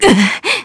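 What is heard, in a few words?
Vox Damage, Korean; Priscilla; kingsraid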